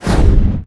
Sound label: flash beam